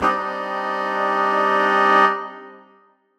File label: Horn Swell Gsus4min6; undercover samples